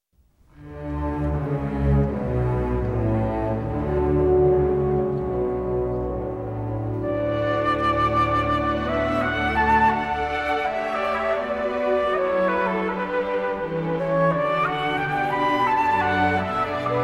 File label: Concertino for Flute and Orchestra; Cecile Chaminade; Op 107